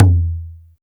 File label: long tail reverb tom one shot e key, tom sample tuned to the E note. Loudest frequency: 448Hz